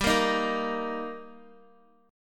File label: G Minor Sharp 5th G down Guitar; strummed; JGuitar